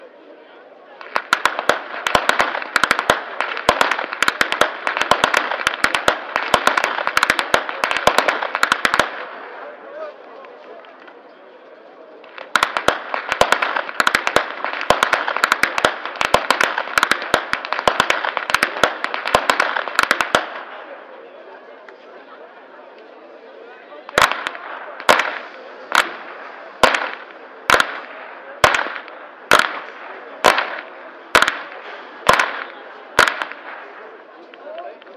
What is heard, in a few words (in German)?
schnalzen, flachgau